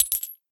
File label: Coin Collect; Angry Birds